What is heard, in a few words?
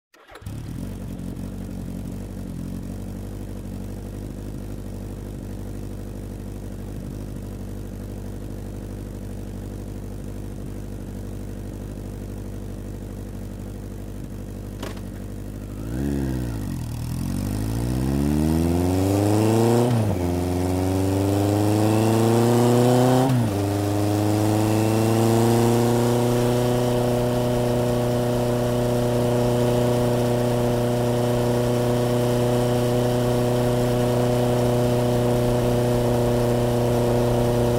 Motorcycle starts, moves, turns off